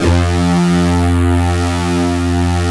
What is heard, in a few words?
SYN OBX, SYN Analog; Sound & Vision - Gigapack I CD 2 (Roland)